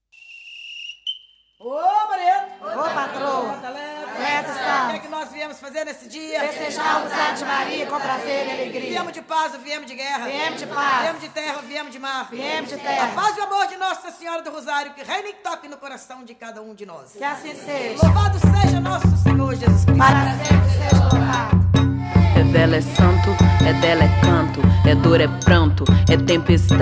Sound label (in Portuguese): procissao coral vozes de campanha